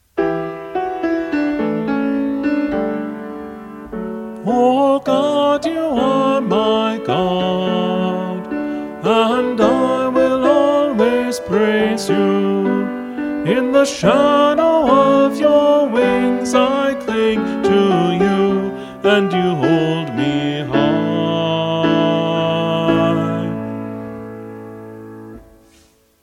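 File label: All Parts, Choir Practice Tracks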